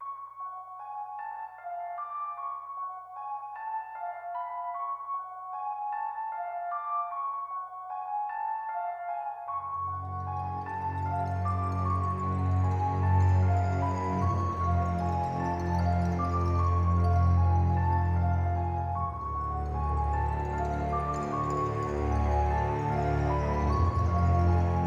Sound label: Suspense Tension Theme; Chained Echoes